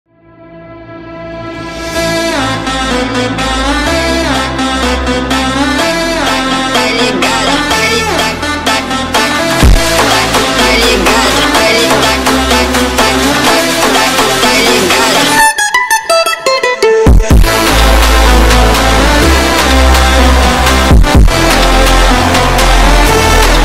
Phonk

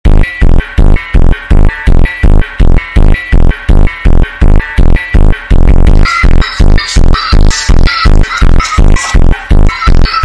Funk sped Up

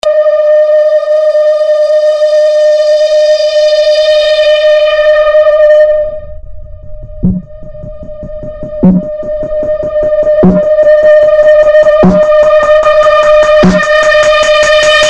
Phonk

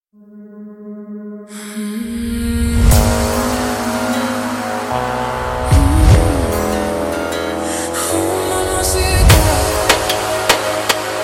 Funk ultra Slowed

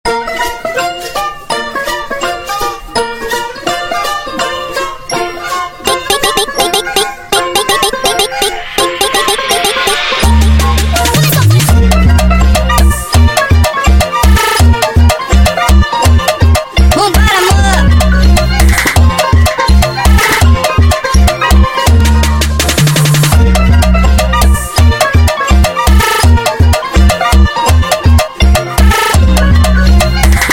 Funk Speed Up, Brazilian Funk

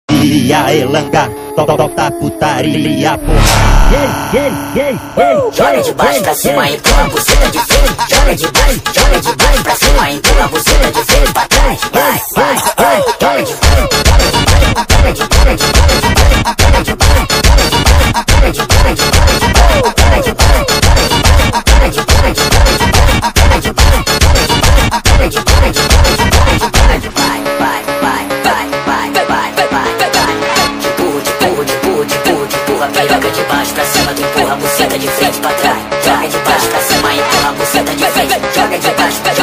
Phonk Sped Up

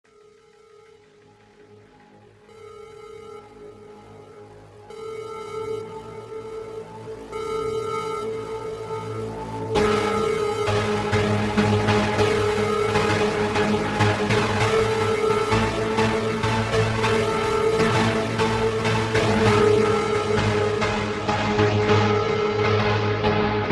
Slowed Reverbed deep flanger echo

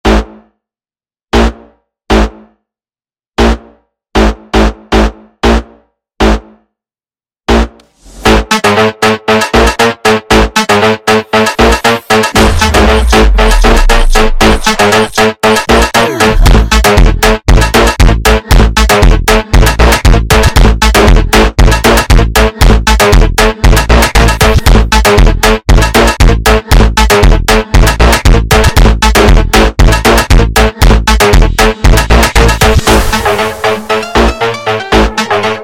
instrumental

